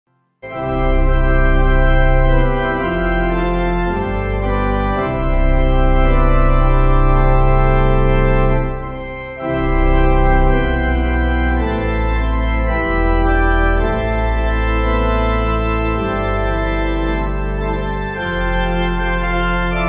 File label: Pipe